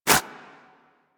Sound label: Armadillo Dig Snow, Foley; Warframe; Hunting Critters